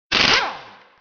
Air Impact Gun

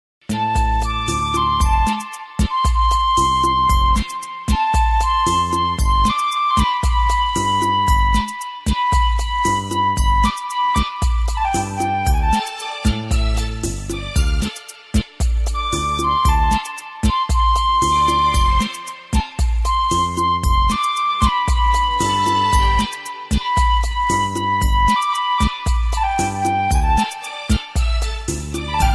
Flut

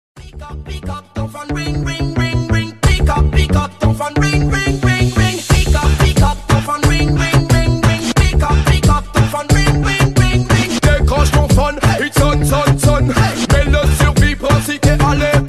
Rasta Ring

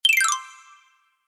Cute Sms

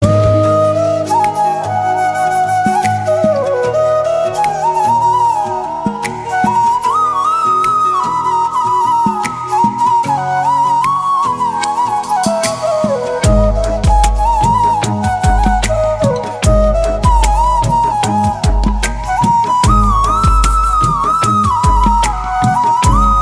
flute

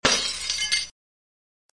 Breaking Glass

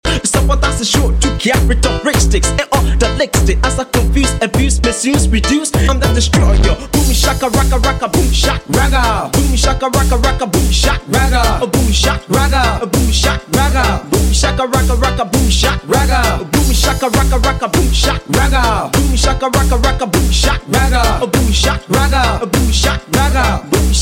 Ragga

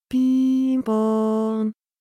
Pin Pon

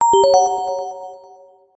Sci Fi Beep

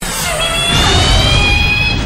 Cry; Tusk Act 4

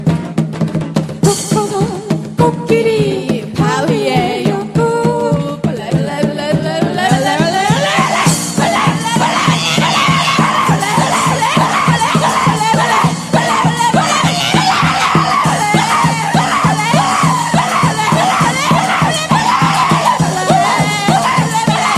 screamo girl

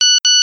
Sms Tone; Gta Iv

